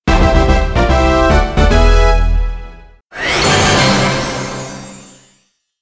Baseball Jingle; Black Panther 2 B-Sides; PSP

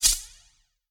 Rub Spark; Pac-Man Championship Edition 2 Plus

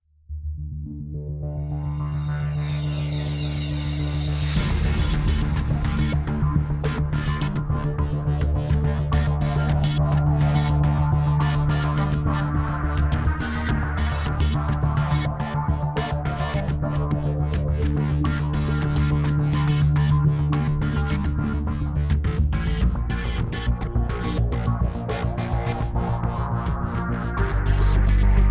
Gunship Battle (Low); Mass Effect 2

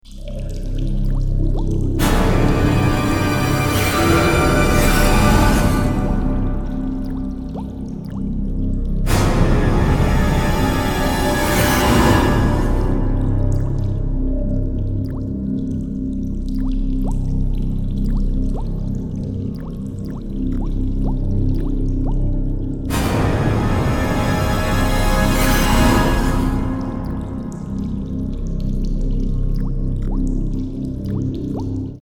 Peace Tense; Serious Sam 2; Mdigbo Jungle And Cave

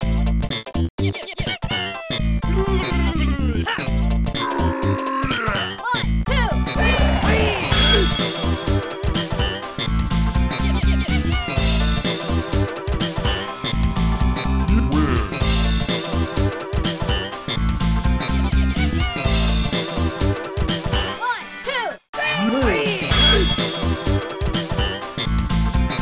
Staff Roll; Saturday Night Slam Masters; arcade

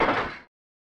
Gate Open; Keiba Eight Special 2; snes

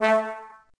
A Trombone

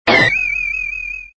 exit painting; Super Mario 63

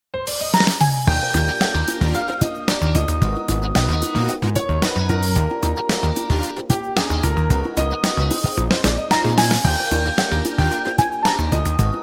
run bgm

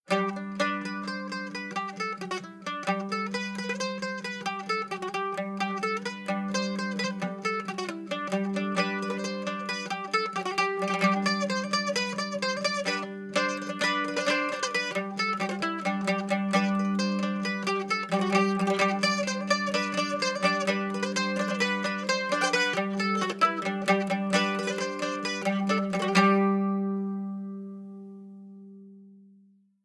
Guitar; Assassin's Creed Unity Dead Kings

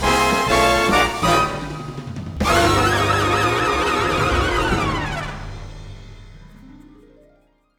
Race Sting Win; lego the incredibles 2018 switch

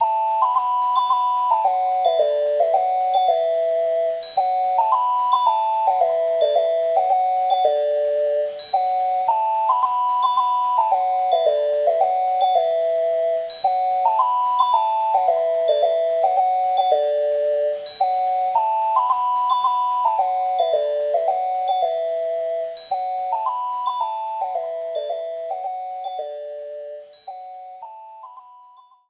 Move Bgm; Gachi Yagura; Splatoon full gamerip

